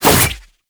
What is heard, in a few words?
Sickle Whoosh; Warframe; Scaldra Butcher Sickle